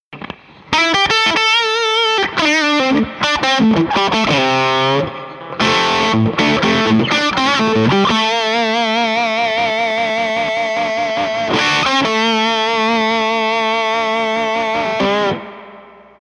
lead, guitars

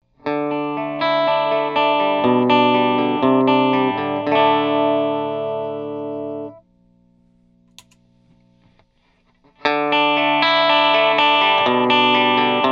Compressor

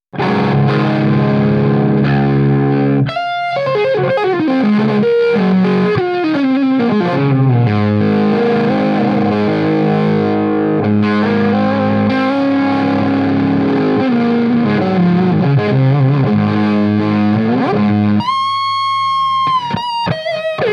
Fuzz Phrase, guitars

